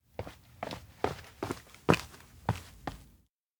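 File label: steps outdoor main street walk; anemoi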